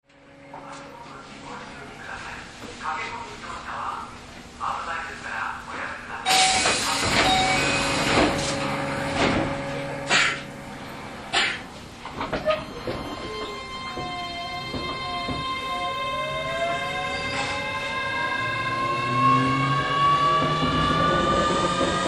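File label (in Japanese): VVVF車 平山城址公園→長沼, 走行音; Keio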